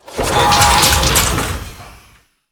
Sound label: Attack; SN1P-SN4P